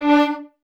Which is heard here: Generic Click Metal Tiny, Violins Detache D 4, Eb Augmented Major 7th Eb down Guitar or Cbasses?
Violins Detache D 4